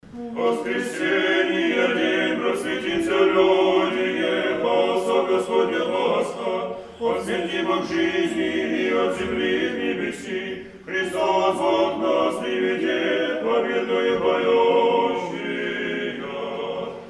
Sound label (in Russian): penie